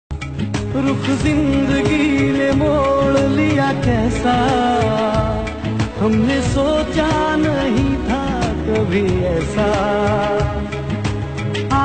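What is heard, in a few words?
Heart Touching Sad Song Ringtone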